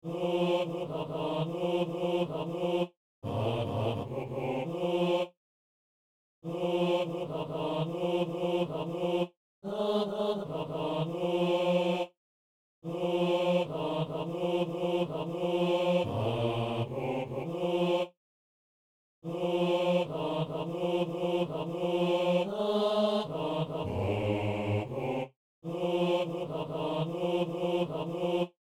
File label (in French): hommes